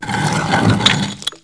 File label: Unlockage Sound; PC; MARIOTEACHESTYPING2